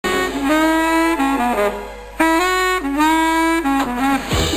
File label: solo